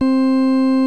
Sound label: 60's Organ; OMI - Universe of Sounds; EII Factory Library